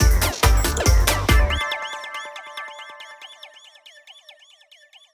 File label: win stinger; Damn pirates